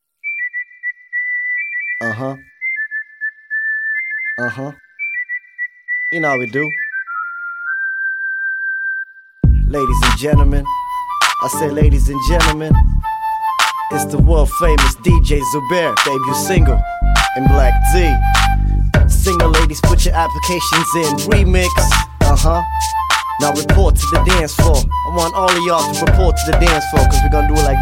whistle ringtone

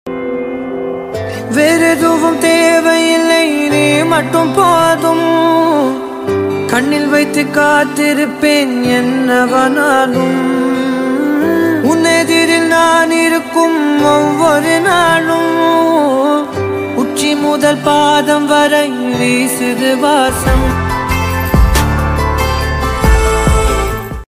malayalam ringtone

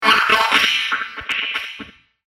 alert harmonica ringtone

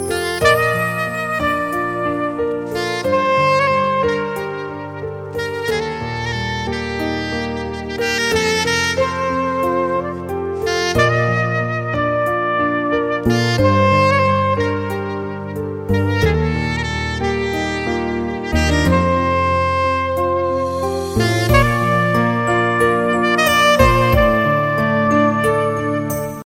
slow iphone ringtone

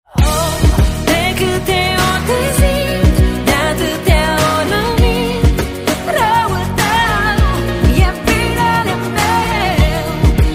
romanian ringtone

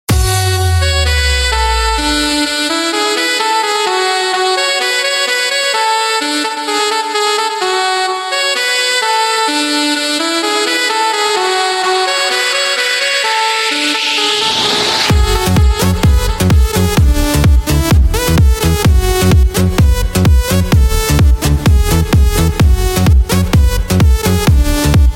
electro dance mix ringtone

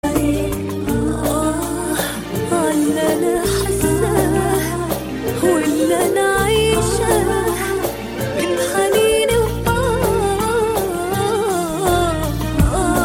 arab ringtone

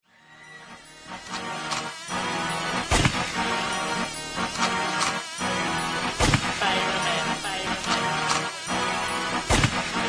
instrumental ringtone

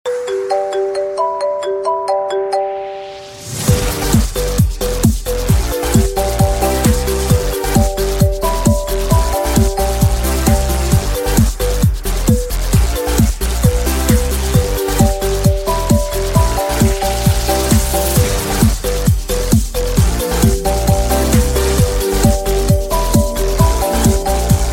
iphone electro remix ringtone

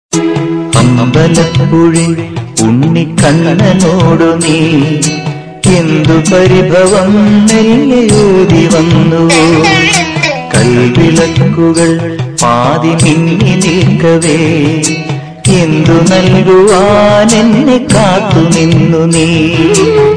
malayalam tune ringtone

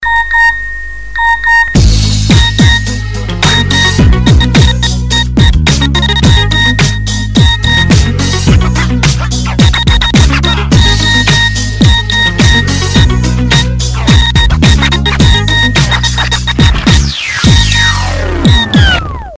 sms ringtone

